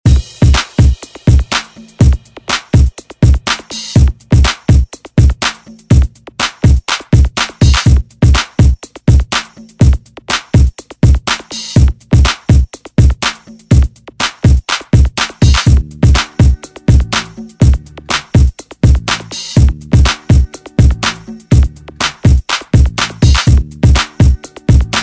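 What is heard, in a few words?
Reggae Extended Redrum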